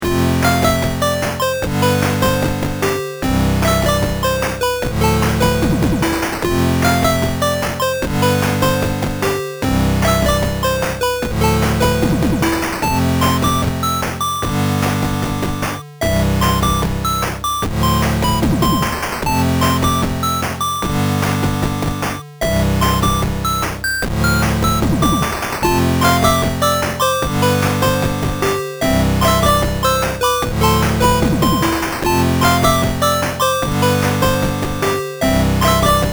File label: town music